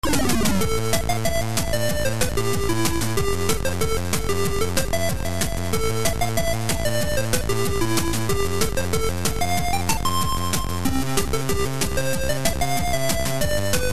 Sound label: 8bit